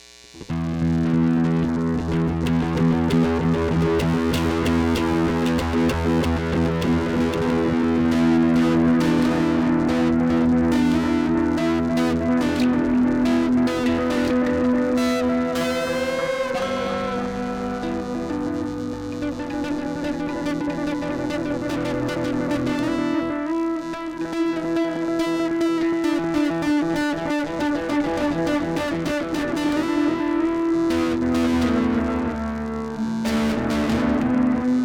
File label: electric improv